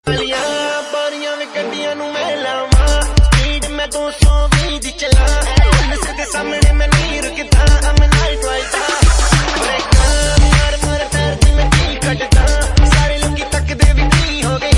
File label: Amplifier Freq